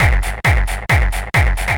Tech loop

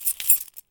Key Chain Shake

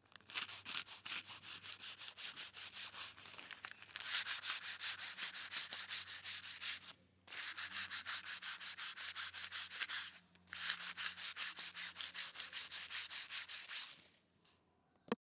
Scrubbing Floor

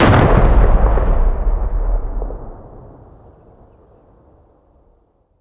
Distance Explosion Sound

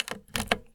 Jack Plug In

